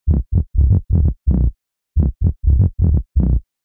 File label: Short Techno Bass Presence; loops